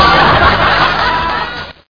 win; schach

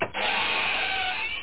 open; PC Gamer IT CD 31; DINK

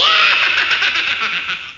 laugh; Groovy Bytes: Behind the Moon; VOCALS

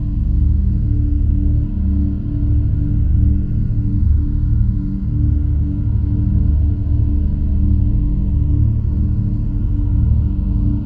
space tone; Earth and Beyond; EA Games

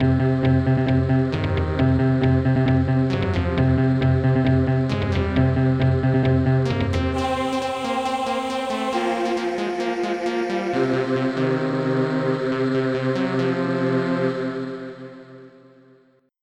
theme; crush; PC Gamer IT CD 21

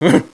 priest Struggle Fade; NETSTORM

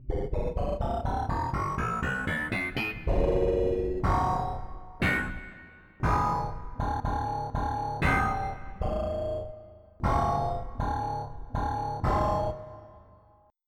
Guitar Pop; Onj's Soundfont

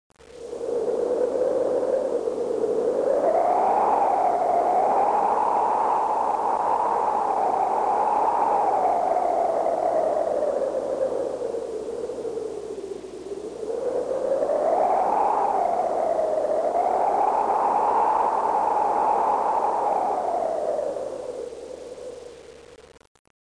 STORM; ambient; weather

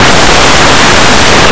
tickets

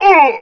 pain; PC Gamer IT CD 41; Daikatana; superfly

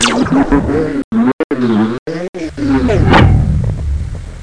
Termite Death; Let's Discover Massachusetts

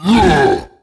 half ogre male attack; DAoC

